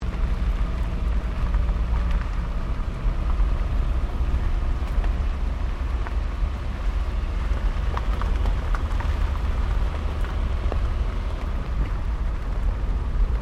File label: Car Toyota Starlet driving loop; Toyota hire car in Japan; Cars; Vehicles